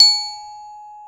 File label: G#3 glock; InVision Interactive; Lightware VOL-1; Instruments & Percussions; GLOCKENSPIEL